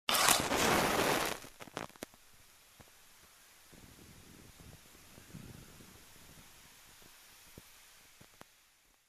Match lighting on matchbox

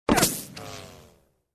Bullet sound

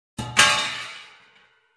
Fallen Aluminum Sound

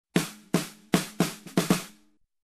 Snare Drum Fill

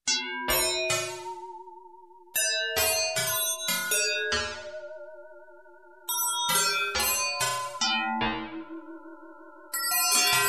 Metallic FM synth plucks

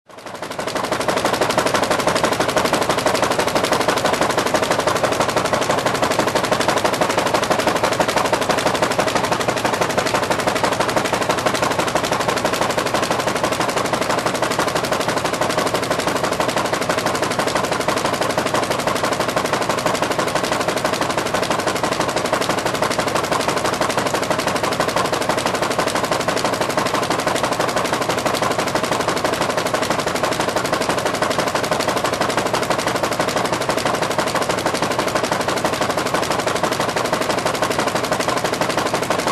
Industrial Machine Engine running

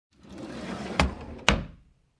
Closing sliding door compartment

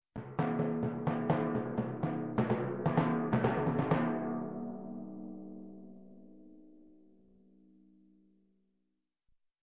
Majestic Timpani Drums

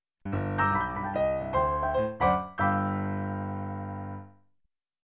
Blues Piano Ending

